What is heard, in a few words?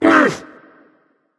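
nonverbal ouch; smite; voicepack; merlin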